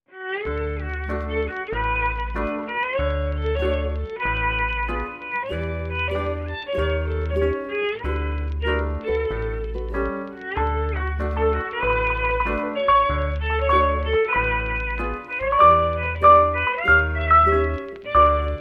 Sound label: Violin Jazz